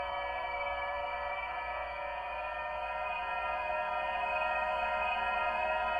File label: AVAS Nissan Leaf